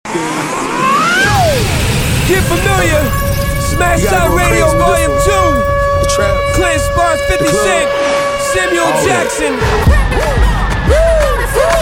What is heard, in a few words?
ring the alarm